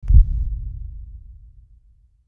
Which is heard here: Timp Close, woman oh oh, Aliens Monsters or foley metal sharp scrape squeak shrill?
Aliens Monsters